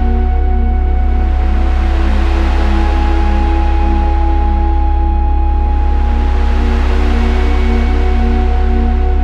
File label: loop, music loop; prism